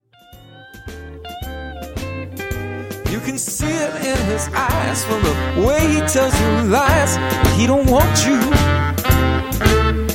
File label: Blues